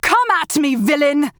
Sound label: Taking Damage; Paladins; Furia